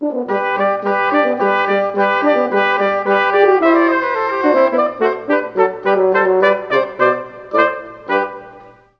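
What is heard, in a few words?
Trp Hn Ob, trumpet horn oboe; Juilliard Music Adventure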